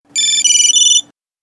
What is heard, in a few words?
Nokia Short